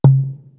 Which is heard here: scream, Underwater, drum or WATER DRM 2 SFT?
WATER DRM 2 SFT